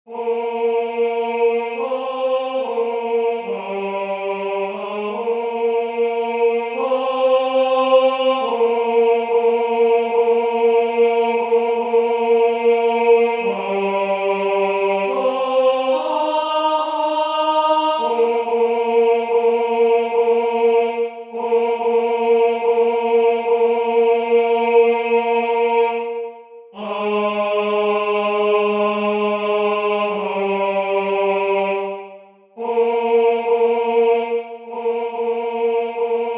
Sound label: Tenor; Stars of the summer night